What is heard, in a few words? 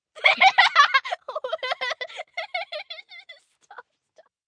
Laugh Tickled, Laugh, 1 girl, 10 yrs, hysterical, human voice, child, kid, female; Laughter Sound Effects